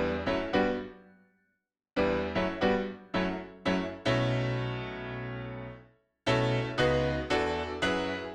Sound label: Piano, E 115bpm; Zero-G; Nu Disco; Construction Kits